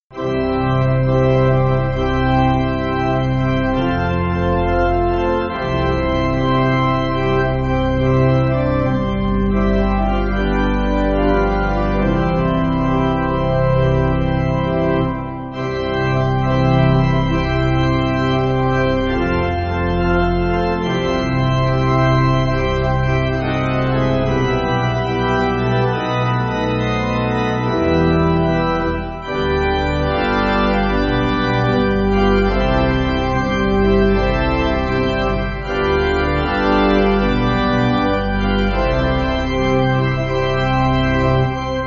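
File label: Pipe Organ, Music Backing; Glory Day; O Holy Night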